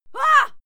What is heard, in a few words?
female fight scream hah; schreie; menschen